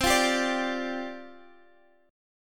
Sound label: C Suspended 2nd Suspended 4th C Guitar Open F; strummed; Csus2sus4; x,x,12,12,10,9